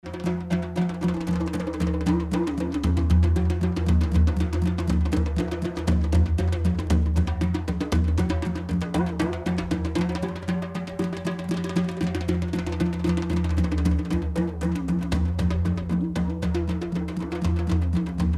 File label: journey of the drums